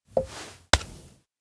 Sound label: shaker pickup; anemoi